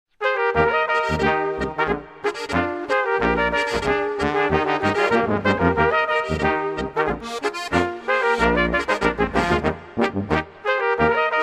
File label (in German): boarisch; beim lanarahof